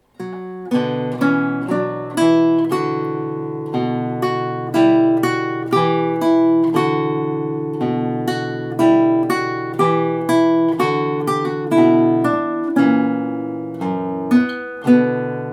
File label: Old French Song, guitar